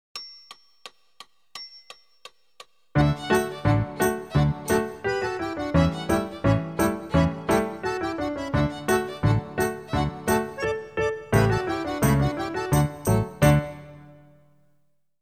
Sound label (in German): irische Melodie